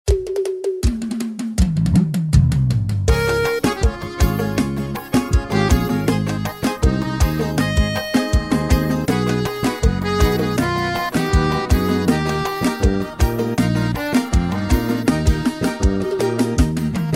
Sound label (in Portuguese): Playback Versao Forro; forro cristao sax alto eb